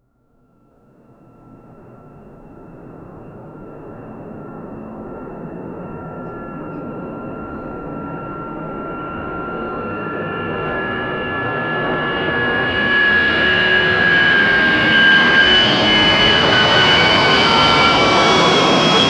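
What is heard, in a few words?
an passing massive airplane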